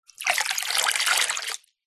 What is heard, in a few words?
household water pour into metal bowl from cup